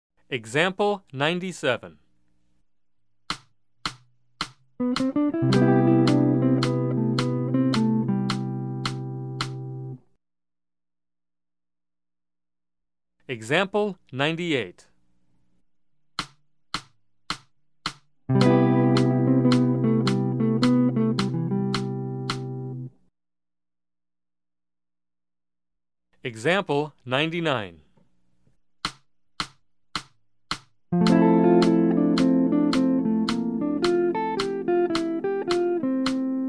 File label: Jazz